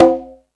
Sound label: Latin Layers, Drum Machines E-MU Pro-Cussion